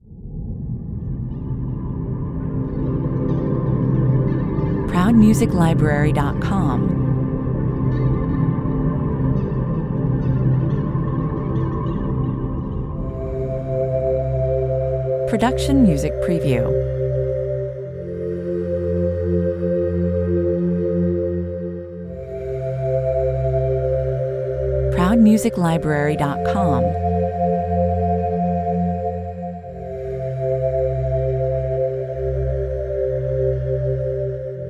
Wonderful, open, timeless and flat opening intro to the presentation